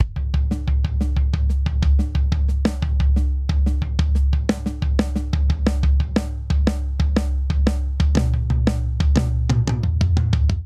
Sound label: Stadium Rock Breakdown 90 bpm; 4 4; MIDI Clips; Ableton; Factory Packs; Drum Booth